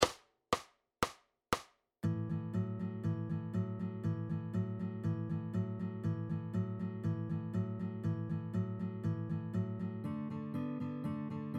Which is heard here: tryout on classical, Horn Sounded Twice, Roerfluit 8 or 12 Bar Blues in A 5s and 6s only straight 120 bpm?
12 Bar Blues in A 5s and 6s only straight 120 bpm